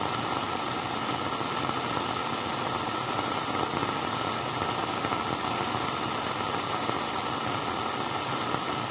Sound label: static weak, Electricity; DynamicEuropa